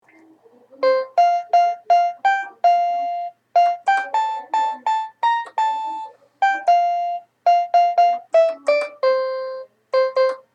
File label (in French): musique